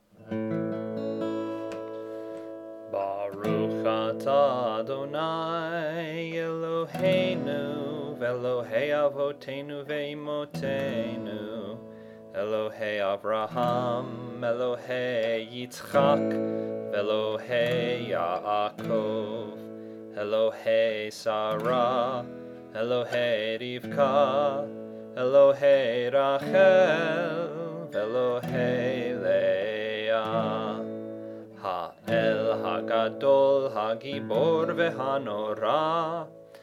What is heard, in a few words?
Avot V imahot High Voice; Hebrew